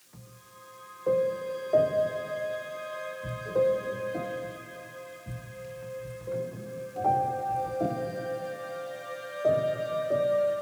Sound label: Wandering Piano; BB 2HTC Samples Vol 4; Solos